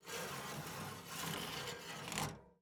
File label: ambience room butchery working layer